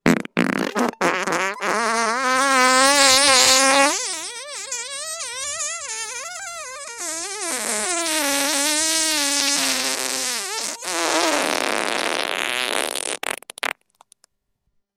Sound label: fart long